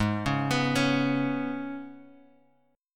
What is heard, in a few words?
G sharp Minor Double Flat 5th G sharp; {4 4 X 4 2 X} arpeggiated